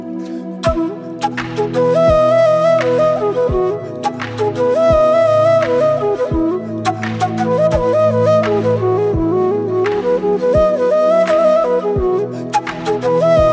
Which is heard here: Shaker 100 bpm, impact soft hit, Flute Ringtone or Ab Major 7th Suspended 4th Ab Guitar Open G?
Flute Ringtone